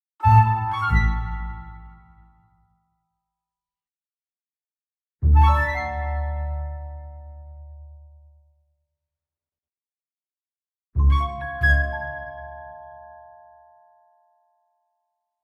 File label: Orchestral Jingle Negative; boom library; The Interface